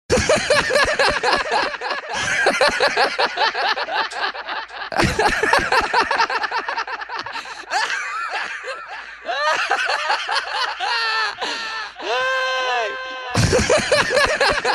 Guys Laughter

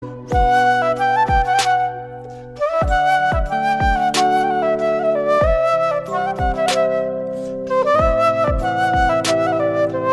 Flute

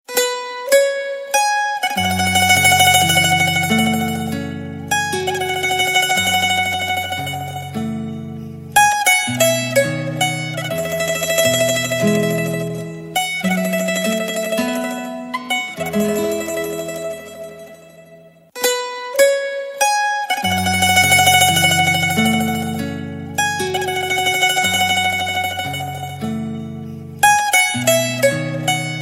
Guitar Instumental

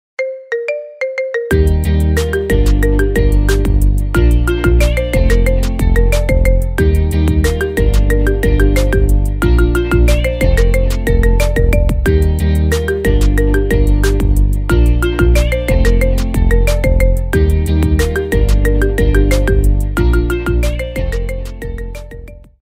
Mood Marimba Remix

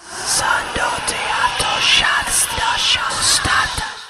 Horror Sms

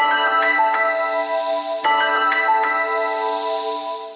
Samsung Glitter

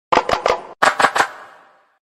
iPhone 15 Massage

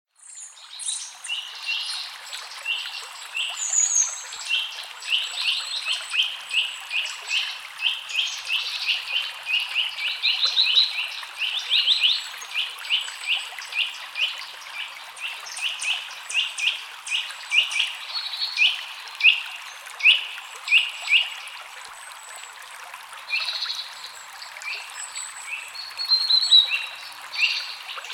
Relaxing Ambience

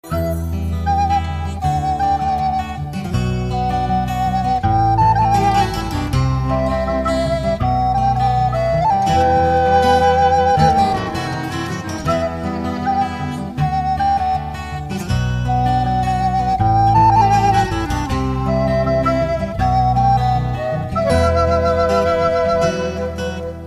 Flut

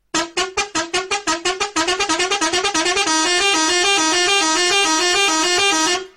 Horn Funny Sound

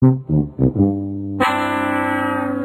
Fail Horn

